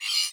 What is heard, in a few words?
Hud Gear Slide Out